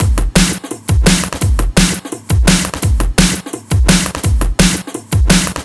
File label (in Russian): Drill Beat, сэмплы ударных drum bass